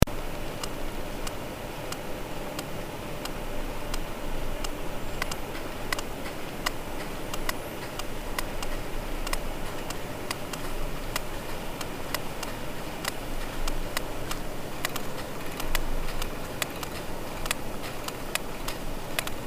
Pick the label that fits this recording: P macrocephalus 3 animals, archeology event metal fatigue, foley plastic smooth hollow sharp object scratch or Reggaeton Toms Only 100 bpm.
P macrocephalus 3 animals